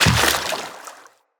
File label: Step Water, Footsteps, Walk; Tenno